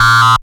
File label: CYBER KIT, DRUMS